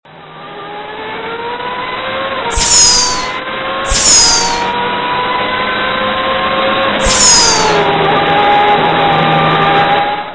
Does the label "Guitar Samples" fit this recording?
No